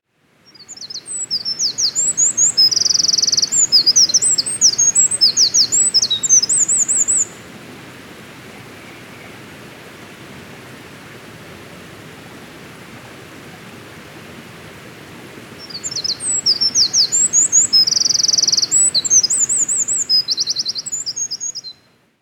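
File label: misosazai, wren; bird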